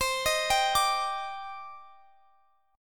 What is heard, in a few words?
Eb Major 7th C; Ebmaj7/C; arpeggiated